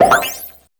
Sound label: BUBBLEZ LO, CARTOON SCI; Spectrasonic Distorted Reality 1